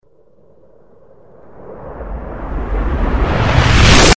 gun, reverse

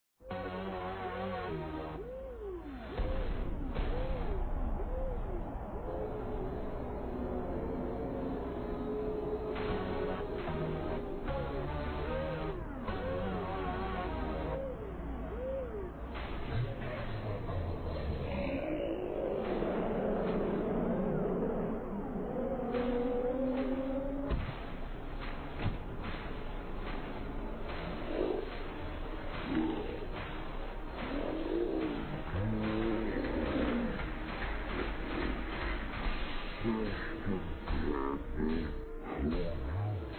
scream

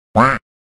quack; reversevape

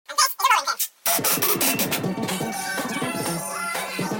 rolling pin

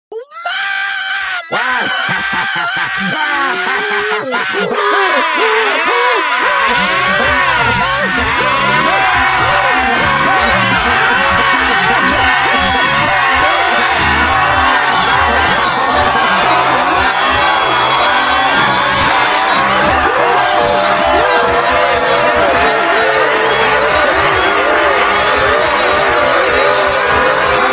screaming

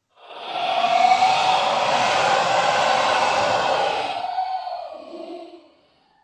augh

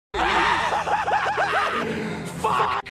haha fuck; cave

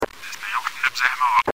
bomb plant; reverse

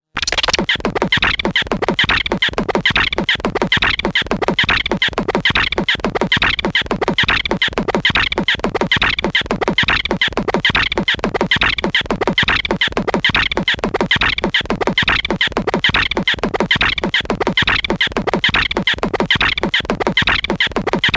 loading

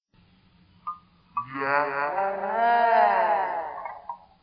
yahaha; vapesquared

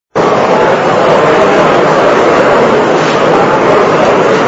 crowd

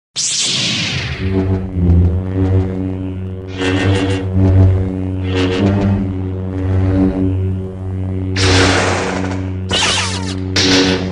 lightsaber; cave

